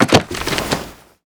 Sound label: bookshelf